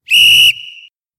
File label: Ref Whistle